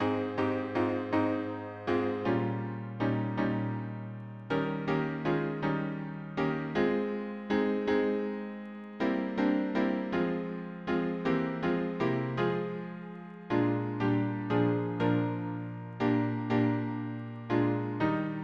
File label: harmony piano